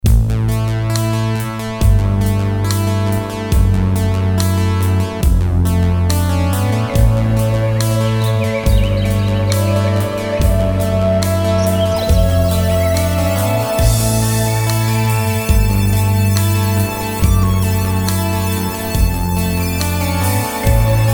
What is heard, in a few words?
Memorymoog psychedelic '60s; demo; Paolo's tweaks